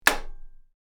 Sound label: Electric fan plastic button switch off; appliances